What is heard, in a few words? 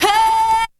VOC; ZERO-G; Datafile